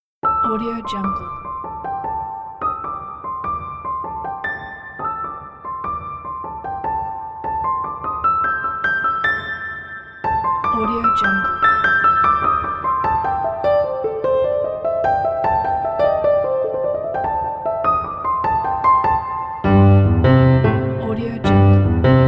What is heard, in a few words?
blues piano solo; bearstockmusic